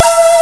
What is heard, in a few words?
jungle